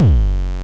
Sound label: FM Kicks Distortion F#1; Drums; LookIMadeAThing Kicks - Vermona Kick Lancet